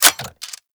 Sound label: Reload; Warframe; Weapons; Zylok Prime